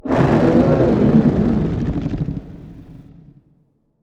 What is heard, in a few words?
attack; Barotrauma; Endworm